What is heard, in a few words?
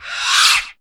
SCRATCH DRY; Q Up Arts; Denny Jaeger Private Collection